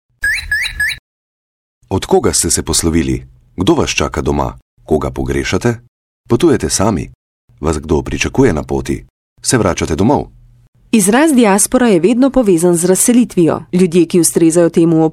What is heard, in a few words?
jingles